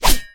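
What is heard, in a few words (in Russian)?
короткий отражающий удар мачете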